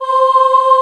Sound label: Choir, VOICE; Best Service Gigapack 1